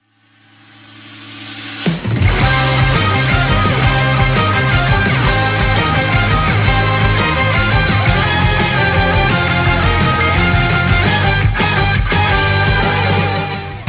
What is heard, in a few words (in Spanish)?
cancion